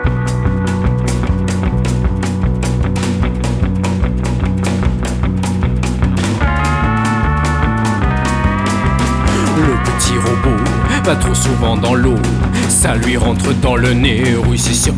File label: Water western